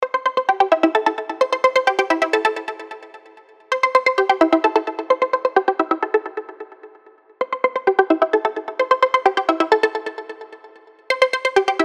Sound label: phone ringtone floating